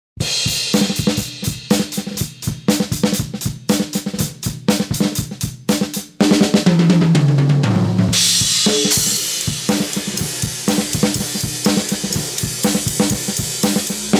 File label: EQ high pass